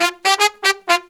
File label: Phantom Horns, 120BPM; Zero-G